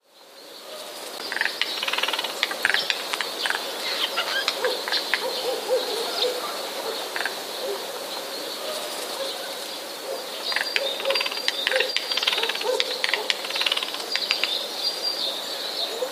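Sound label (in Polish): terkoty, sójka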